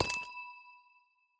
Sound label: step, block, amethyst; Minecraft